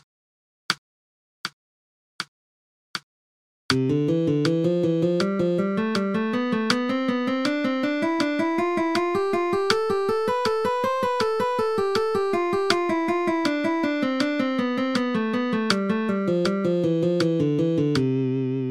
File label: alternate picking exercise, guitar